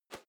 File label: whoosh feather; swooshes-swishes